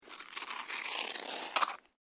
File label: SNOW CREAK